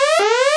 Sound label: Alarm